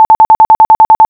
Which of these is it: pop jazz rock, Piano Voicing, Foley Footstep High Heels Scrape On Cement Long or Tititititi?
Tititititi